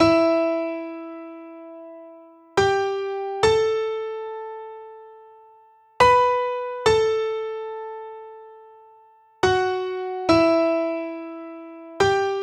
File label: Selection Kit 140BPM Piano DRY, E minor; Melody & Synth; Vengeance Samples